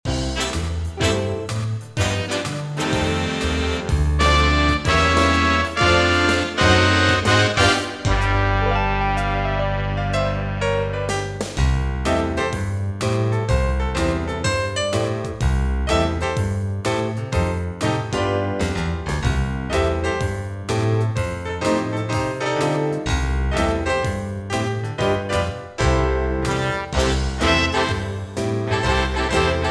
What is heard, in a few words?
Big Band Sentimental, ringtone; MIUI12